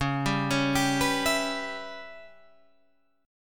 Db Minor 7th Flat 5th Db Guitar Standard; arpeggiated; 9,10,11,12,12,12

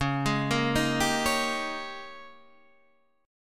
Ab Major 7th Suspended 2nd Suspended 4th Db

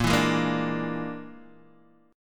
Bb 7th Flat 5th Bb, Guitar Open D

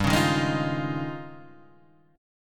D sharp 11th G

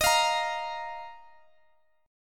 D sharp Suspended 2nd, Guitar, Open G

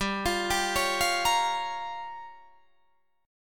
G Minor 7th Flat 5th, Guitar Drop D

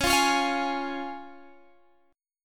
C sharp Major, Guitar, Standard; strummed; JGuitar

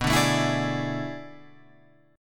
B Minor 13th B down Guitar Open F; Bm13 strummed; JGuitar

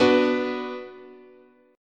B Minor, guitar chord

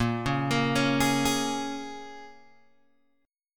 A sharp Minor 7th, A sharp, down, Guitar, Open G; A#m7; arpeggiated

